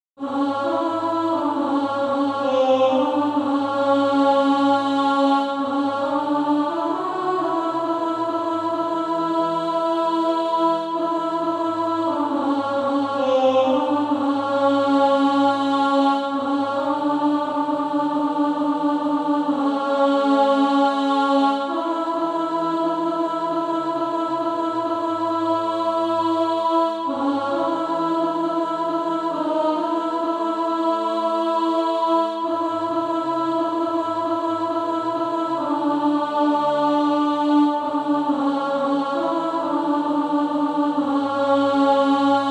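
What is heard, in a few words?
alto, SATB Practise Music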